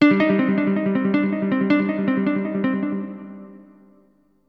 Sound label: ELECGUIT, AMBNT, 160, C; Ambient; Guitar